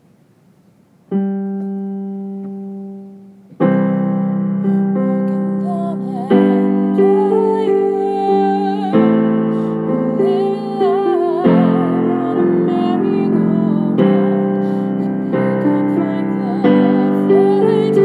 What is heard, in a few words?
solo vocal